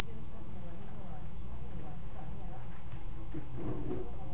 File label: cloth